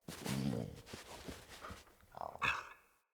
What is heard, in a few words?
Pack Wolf Idle Grunt; Animals